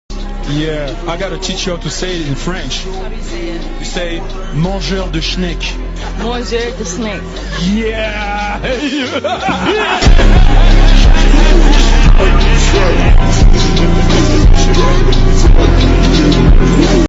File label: laugh; doflamingo